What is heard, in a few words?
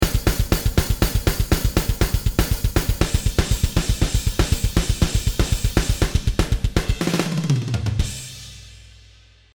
HH DB Groove stop